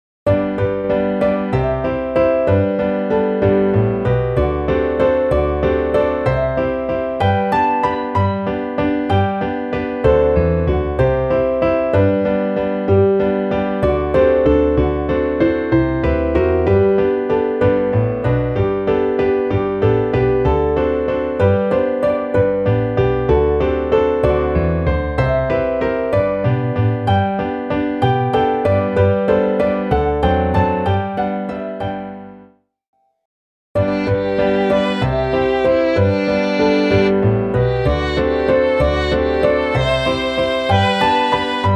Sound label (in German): midi, klavier; Wo die Blaue Blume blüht; Uns zieht ein Sehnen aus dem Haus